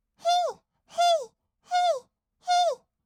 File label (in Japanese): へいへい, kawaii voice, 合いの手